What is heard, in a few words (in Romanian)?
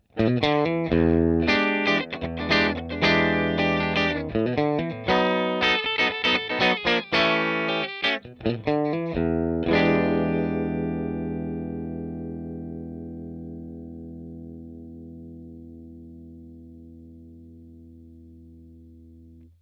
Clean Cablu Mogami; Line 6 Relay; wireless pentru chitara de la Line 6